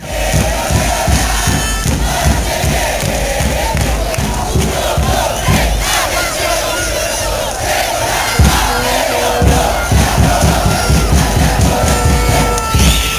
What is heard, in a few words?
fanchants, chants; audax italiano chili